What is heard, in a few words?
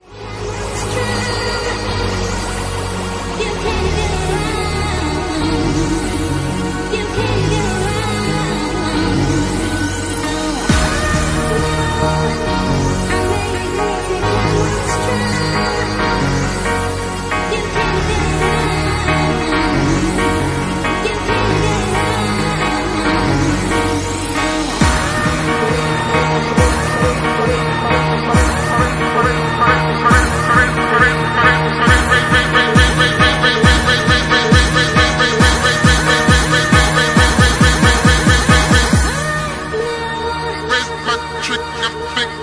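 Bassline Mix, Bassline House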